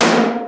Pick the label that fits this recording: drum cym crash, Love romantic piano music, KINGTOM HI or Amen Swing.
KINGTOM HI